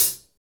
HH HH, HH Real

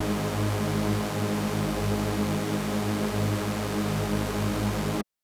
SuperSaw G#3; SuperSaw1; KG MegaSaur; M8

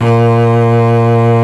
ARCO CELLO, Solo String; E-MU Producer Series Vol. 2; More Studio Essentials; Composer; Solo String Combo

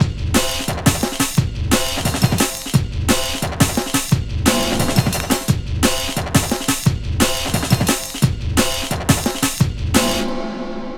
Rugged Break, Drum & Bass, Beats & Breaks; Fluid Dynamics

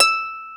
concert harp E5; InVision Interactive; Lightware VOL-1; Instruments & Percussions

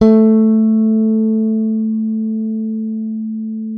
A3, FINGER MUTE; Xsample VOL-1

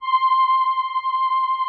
VP-330, String Machines

